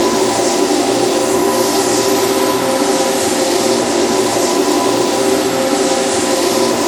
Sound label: speed shrine buff loop; odin